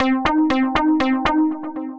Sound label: Synth Rhythmic; Kneejerk Reaction